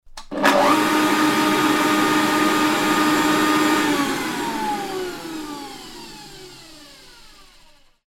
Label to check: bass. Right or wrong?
Wrong